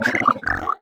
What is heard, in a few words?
Mud Lung Mungling Roar